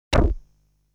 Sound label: Sound striking the rock; Legend of Hero Tonma